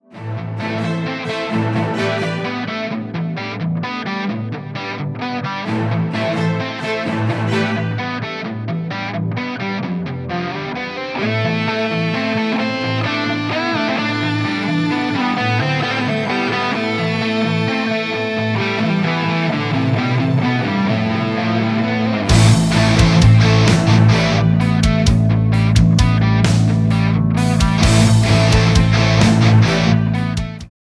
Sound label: Karaoke